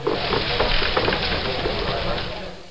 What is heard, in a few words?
GRANARY; Caesar III